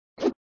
Sound Grail switch, flipper; Grail switch v3

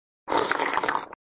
Sound Slurping (prior to burp), flipper

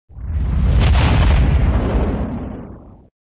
Sound SFX, flipper; sttng

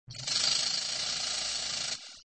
Sound Snake, flipper

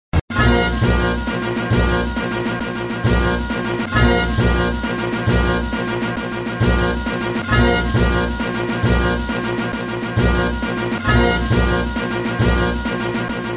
Music, flipper; sttng

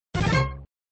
Sound rollover lit, flipper; INDY

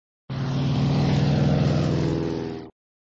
Sound Plane car (ramps), flipper

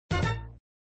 Sound Fanfare End of Ball, flipper; Fanfare End of Ball v02

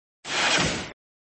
Sound Woosh, flipper

